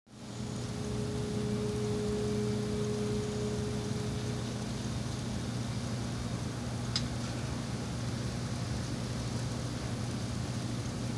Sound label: A432 tuning